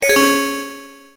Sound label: Notifier Crystal Ringtone